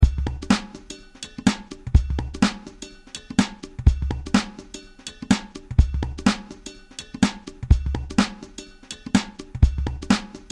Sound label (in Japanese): ドラム ループ BPM125